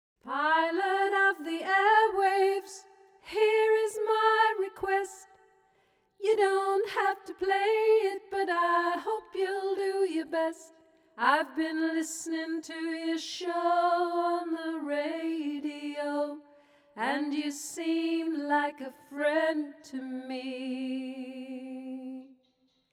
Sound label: Lead Melody